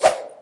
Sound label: whoosh; abilities